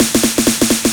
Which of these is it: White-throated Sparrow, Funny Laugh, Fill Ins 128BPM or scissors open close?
Fill Ins 128BPM